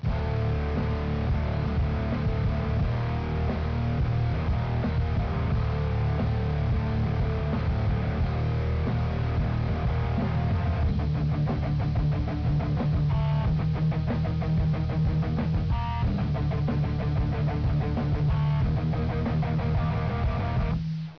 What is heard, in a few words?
buzzer